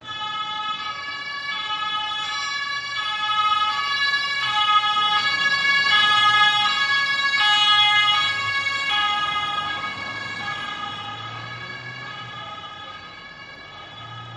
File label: german ambulance sirens wailing loop; the sound pack tree